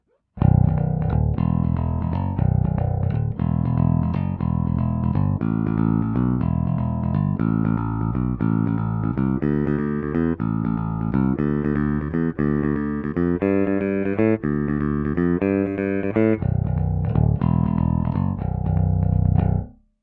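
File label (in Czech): Baskytara Schecter SLS Elite, pražcová, 5 strun, trsátko, D'Addario NYXL Nickel plated steel, roundwound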